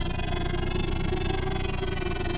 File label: Speed loop, Shuttle